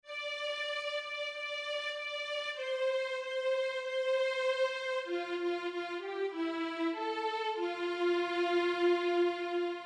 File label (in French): KYRIE VIOLON; St Jean